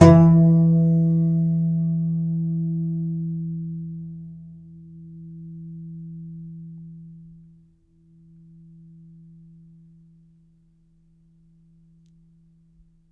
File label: C.Bass Balalaika, Plucked Strings; E-MU Producer Series Vol. 7 – Old World Instruments